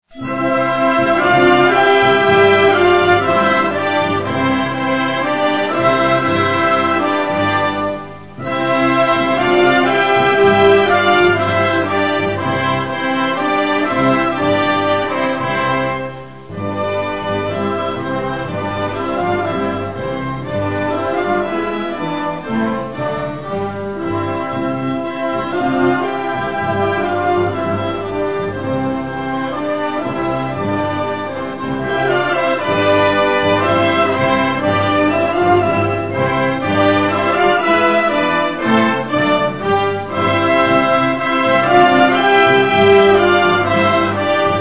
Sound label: hymn; hymny godla